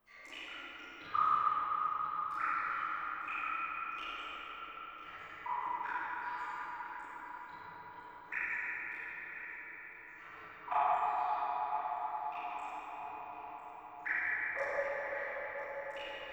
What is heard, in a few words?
water drops; OneShot Fading Memory